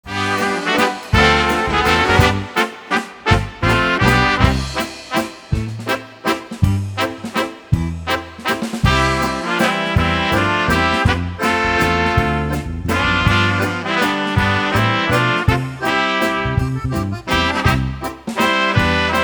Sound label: Waltz